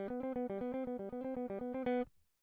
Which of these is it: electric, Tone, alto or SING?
electric